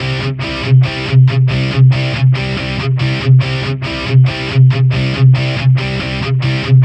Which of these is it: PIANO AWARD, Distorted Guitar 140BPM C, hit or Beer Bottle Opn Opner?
Distorted Guitar 140BPM C